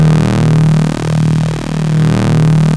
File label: OB D#2, Rare Synthesizer; Hollywood Edge - Giorgio Moroder Rare Synthesizer Collection; OB 4 VOX